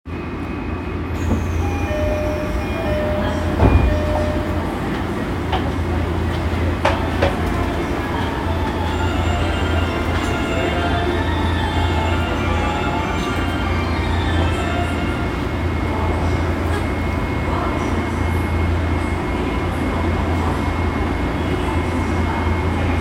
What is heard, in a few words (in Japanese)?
有楽町線池袋東, train sounds